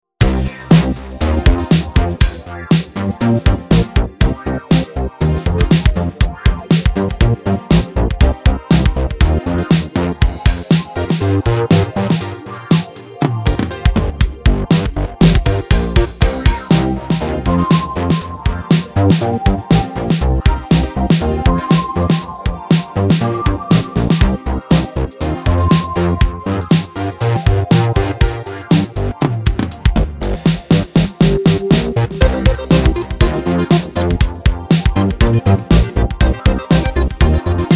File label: Snuttig Funk Pop; There's kind of a "bouncy" feeling to this little tune